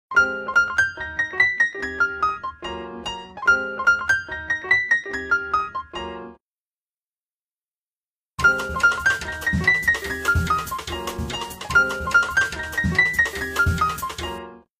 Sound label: Swingtime, ringtones